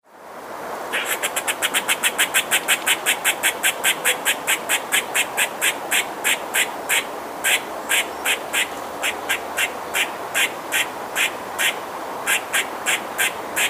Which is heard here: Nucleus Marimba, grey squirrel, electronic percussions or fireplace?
grey squirrel